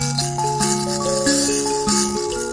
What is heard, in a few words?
mbira loop; WORLD